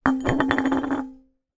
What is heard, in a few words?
household glass jar large down wobble; zapsplat